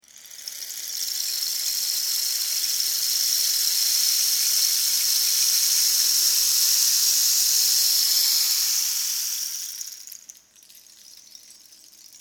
Rainstick, Cactus Rain stick instrument with beans inside falling.